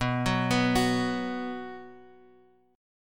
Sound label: B 5th B, chord; B5 Chord {X 2 4 4 X 2} arpeggiated